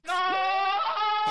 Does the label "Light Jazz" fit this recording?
No